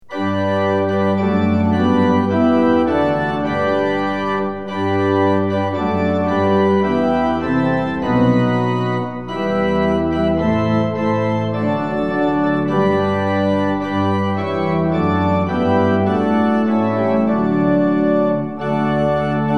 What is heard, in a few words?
tune; hymns; lillenas